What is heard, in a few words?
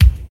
short steel kick drum sample c key